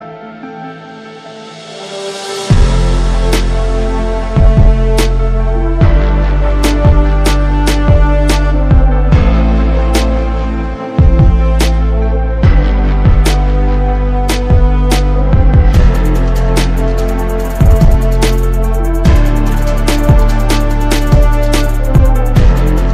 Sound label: Theme Song Remix Bass Boosted Bass Boost Ringtone; PUBG